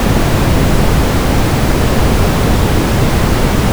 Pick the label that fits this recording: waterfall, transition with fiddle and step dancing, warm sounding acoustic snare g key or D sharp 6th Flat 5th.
waterfall